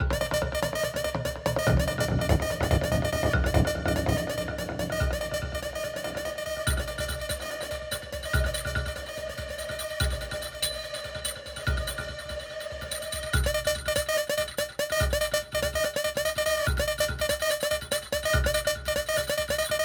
Music Gameplay State Tension; Music Hideout2; H2 New Level Area=Aquarium

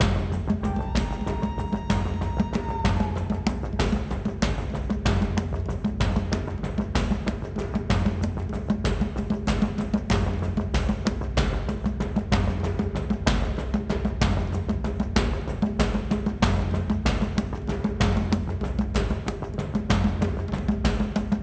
Battle Day; Frozen Flame; windows

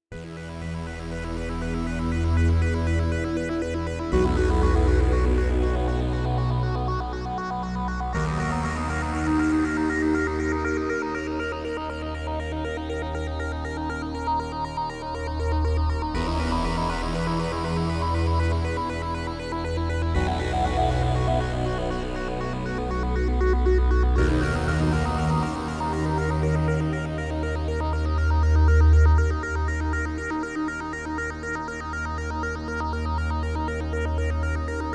Music

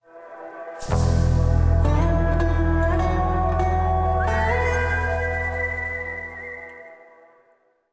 Quest Cave Win

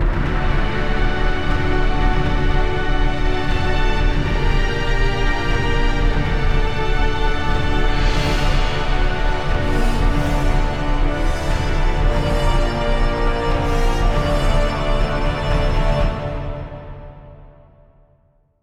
Victory; King's Bounty Dark Side

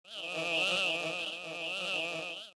Flies; K Scrolling Flies

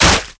zombie death

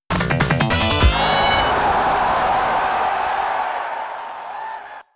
GOAL, TOUCHDOWN; レッスルボール; Namco Bandai Games

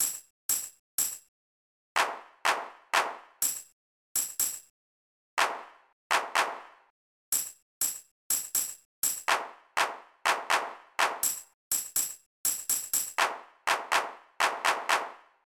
BGM Game; minna no oekakiya san 2009 nds gamerip

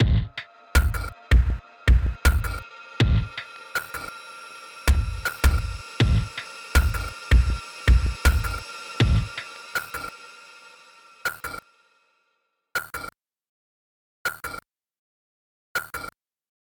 Music; Wug Music Hideout4

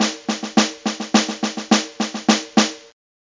Snare; kentei kyoukai imasara hito ni wa kikenai otona no joushiki ryoku training ds

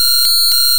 Screech; Pokemon; Gen 1; attack moves; RBY

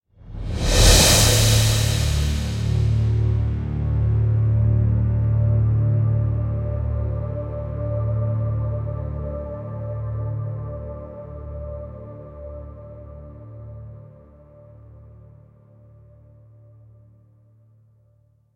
Swell; homefront gamerip 2011

